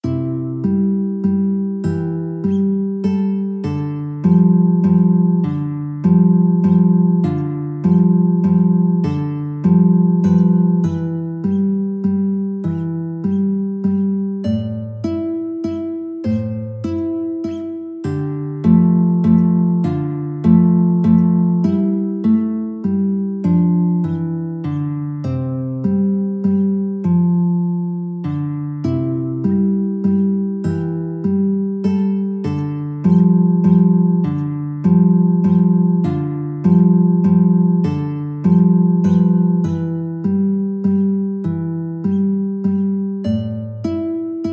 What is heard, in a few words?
guitar